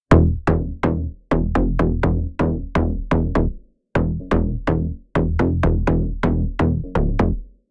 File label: warm mid bass processed; kern audio